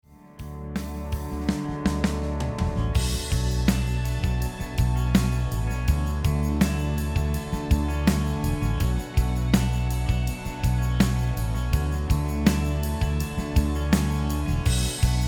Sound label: rhythm